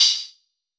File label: Soft Bright Crystal, Hats; Airwindows